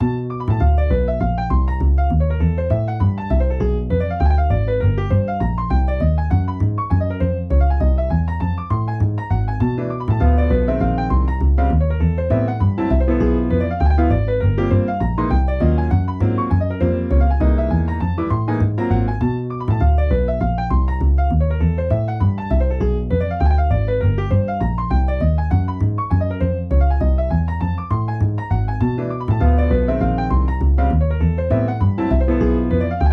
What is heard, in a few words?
night day last eight harmony